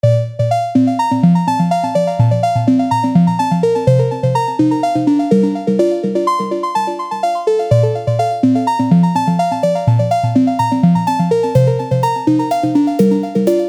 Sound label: 1khz; Recording Connection